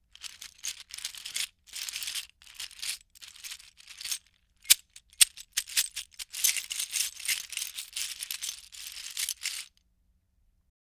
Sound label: Bag of Seashells